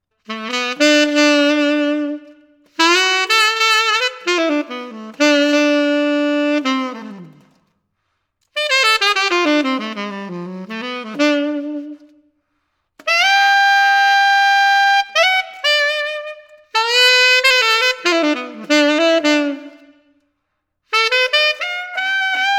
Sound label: Reverb; OLEB; Conn 2-5H